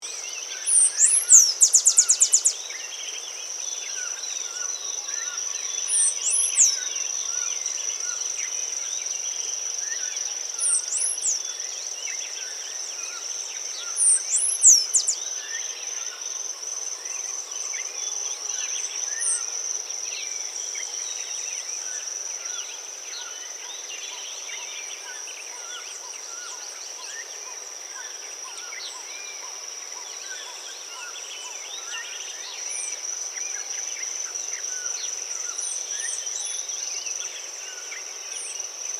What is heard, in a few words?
black capped sparrow